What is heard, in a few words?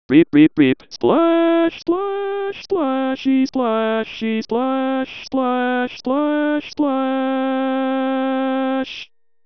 splash